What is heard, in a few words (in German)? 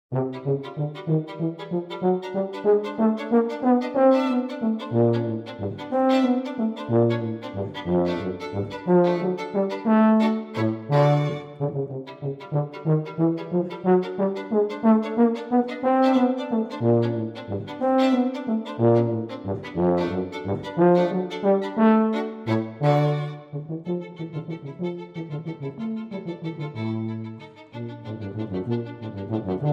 Unterkagner Ländler für Violine und Tuba, Allegro molto